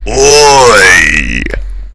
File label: oyyy; svencoop